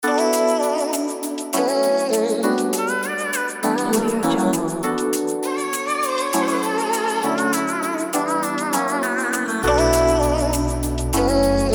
future pop

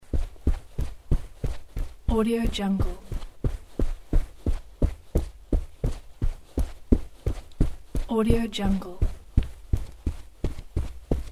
heavy footsteps on carpet running

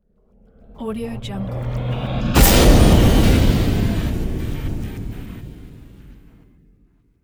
trailers hit

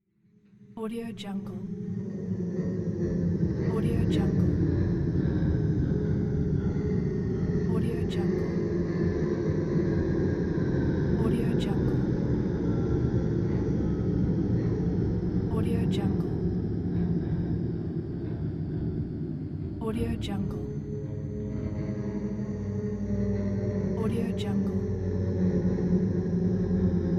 horror drone

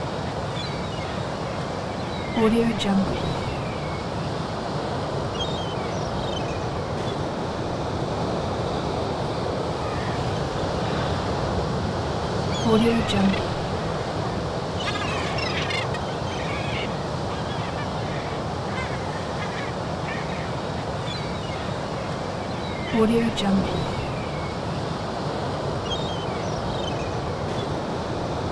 calm beach ambience

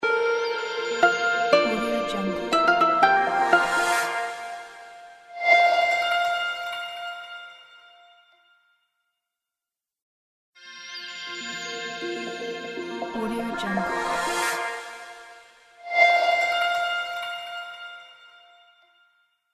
chilled discovery revealing logo

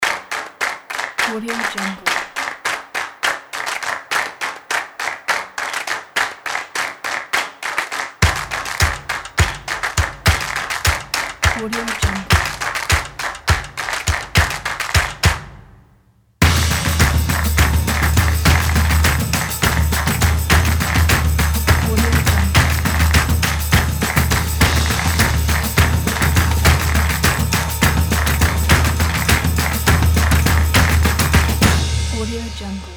claps and drums

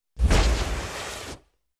thruster blast

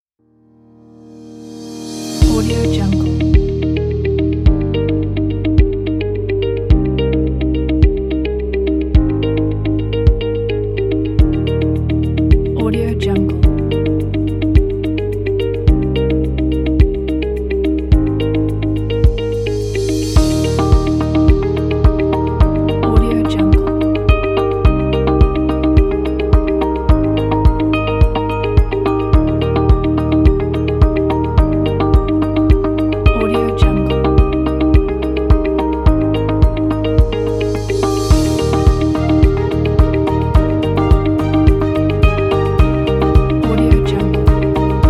gentle background experience

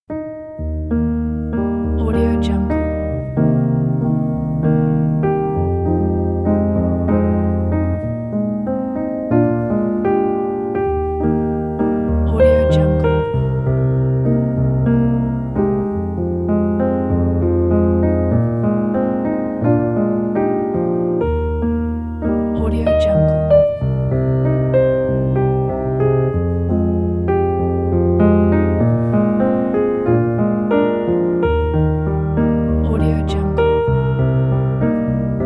gentle piano

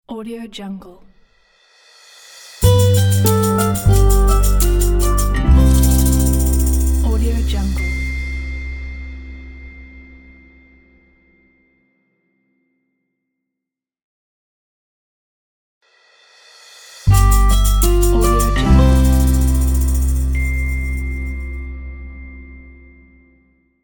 nice acoustic logo

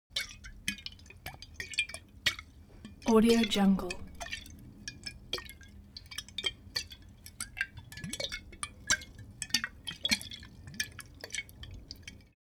object bottle vodka movement; smartsoundfx

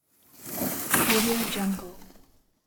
sled passing by

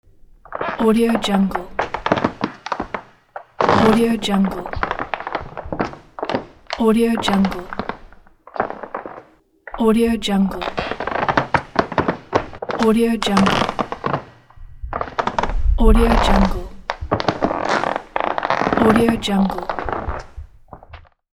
creaky floor

